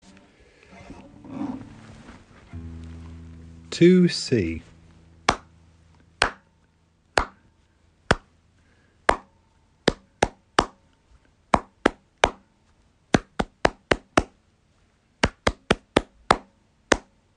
rhythm

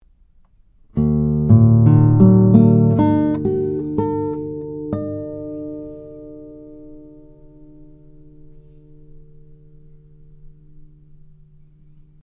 30cm from mic, guitar

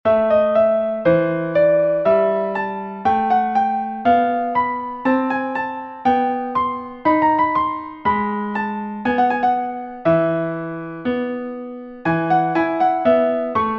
trills

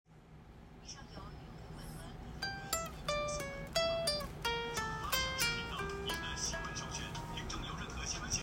slurs, guitar

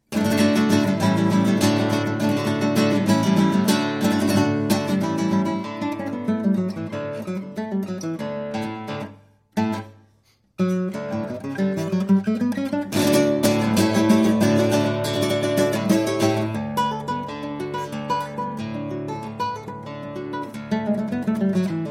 Danza Blumlein mixed

